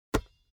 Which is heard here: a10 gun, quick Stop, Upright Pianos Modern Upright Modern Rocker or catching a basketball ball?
catching a basketball ball